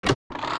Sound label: chest; zelda